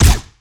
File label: Lasrgun Guardian Pistol Fire